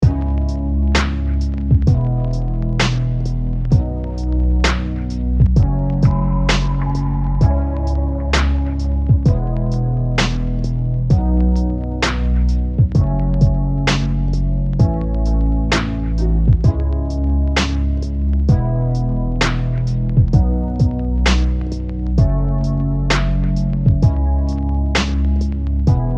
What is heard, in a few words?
Loop